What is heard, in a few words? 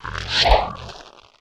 Attack; Fitton